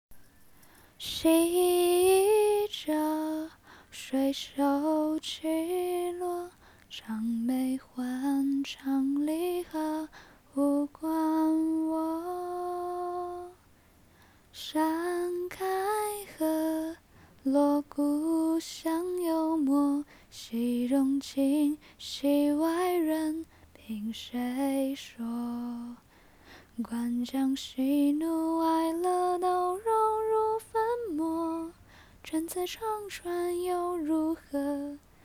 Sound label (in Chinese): 清唱